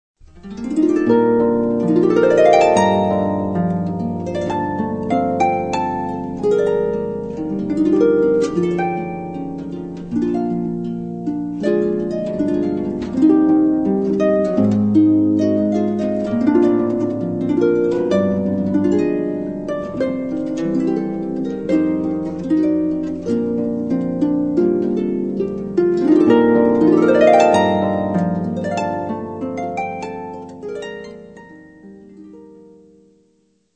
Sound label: solo harp